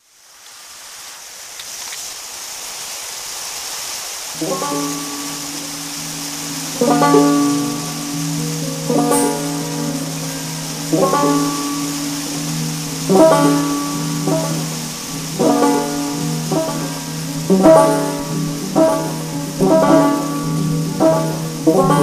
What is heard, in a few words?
guitar banjo and rain